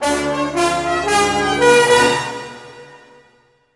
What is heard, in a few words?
brass accent; Orchestral Loops